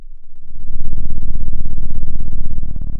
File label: Sound of an engine revving